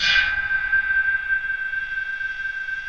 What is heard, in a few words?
METALIC C3; Best Service; Advanced Media Trax Modern Composer; TRANCE 1